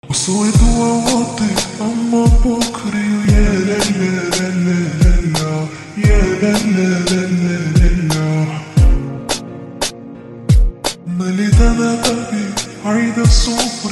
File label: Gudok, slowed version